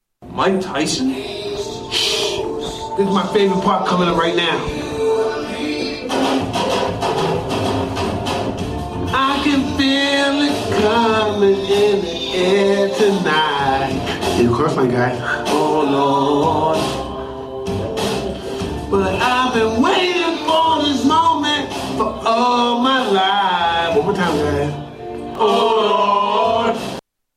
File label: singing; the hangover; mike tyson